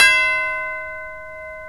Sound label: CHIME REL., SYNTHS; Kid Nepro; Elite